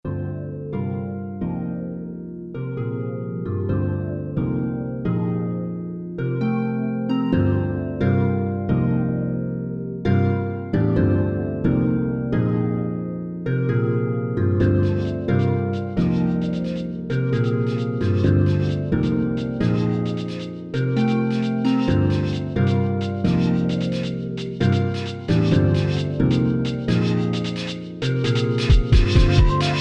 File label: pink noise; anaheim